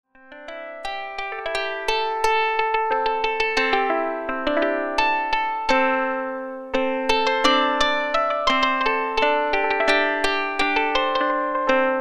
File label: Koto, Roland MKS-50; Factory examples